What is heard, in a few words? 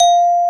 xylo F